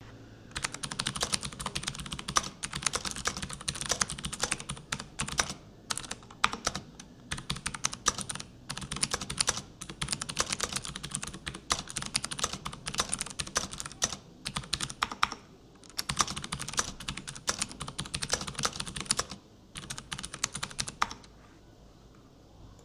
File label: wood keyboard